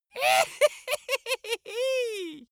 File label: dwarf laugh gleeful